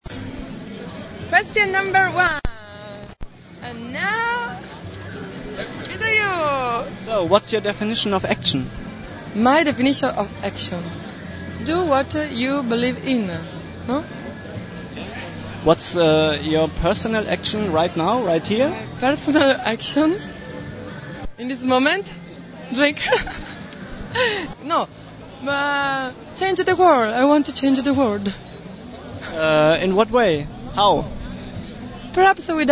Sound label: italian punk